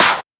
Clap; FLPRO

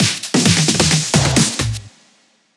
EDM Drum Fill; FL PRO

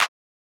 Clap

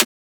Transient; FLPRO

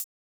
HiHat

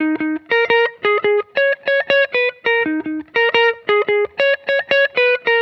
Electric Melody Loop 170 BPM Eb Min; Cymatics

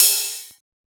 Ride; FLPRO

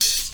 P, PERCUSSION

